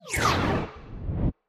lucky Mug Spin Out; finn

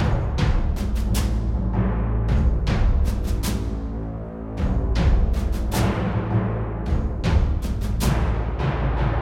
fs Outro Loop; oceans treasure

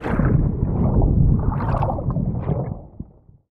water element wild spread; elements

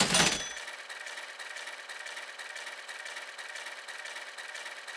spin Button Click; piggy riches

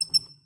ambience lamp; dead or alive 2

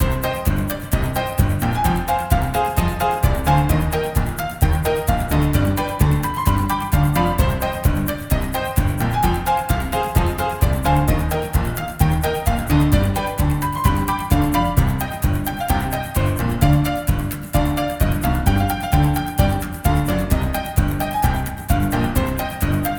free Spin saloon old; dead or alive 2

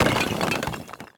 wild Sym Crack; elements

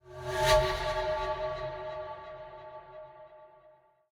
free spin start; rise of maya

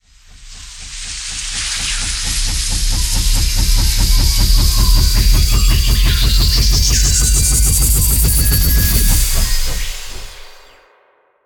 near Win; jungle spirit

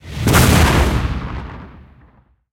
big Win Text Change; shangrila

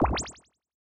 Win Count Up End; magic maid cafe

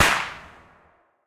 betline Effect; wild turkey

